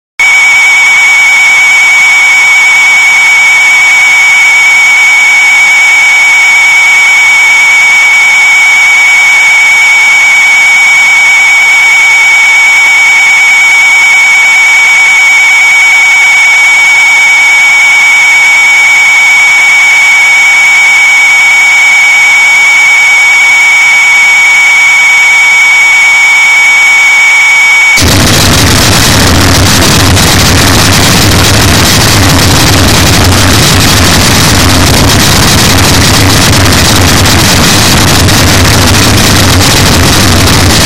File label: Morning Noise Broadcast